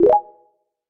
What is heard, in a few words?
break